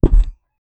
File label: low bassy sound of plasti